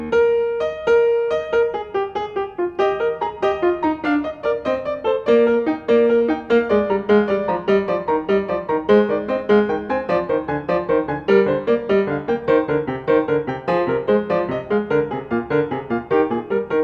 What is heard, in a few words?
Gigue; Glenn Gould; Bach The French Suites Nos. 1-4, BWV 812-815